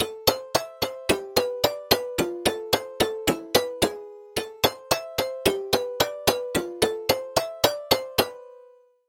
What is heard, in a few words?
Percussion, Tick Tack; Routed Sound; Serum 2 Expansion; Story Walker Vol.1